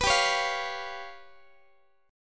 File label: G 7th Flat 5th, G, Guitar; G7b5 strummed